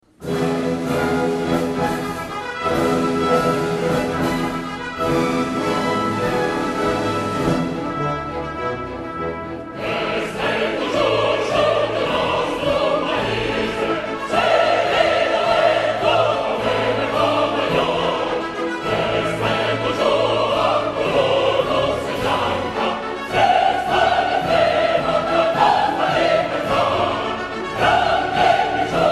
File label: orch chr